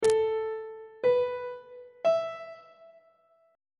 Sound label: g double sharp suspended 2nd triad chord intervals on treble clef